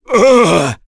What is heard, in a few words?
Vox Damage; kingsraid; voices heroes; en